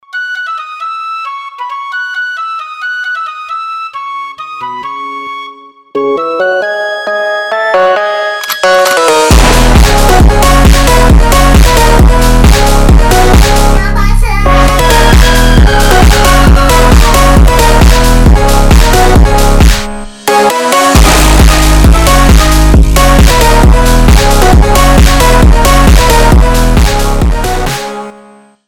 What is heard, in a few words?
beer phonk